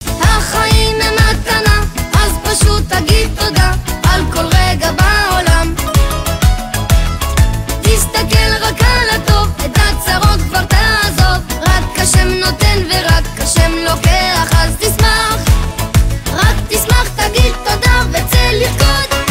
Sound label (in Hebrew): רינגטון